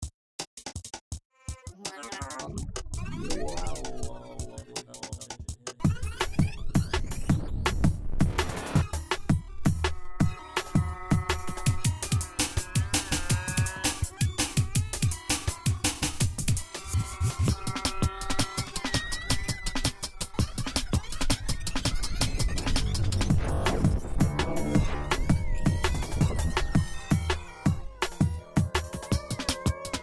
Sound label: drum and noise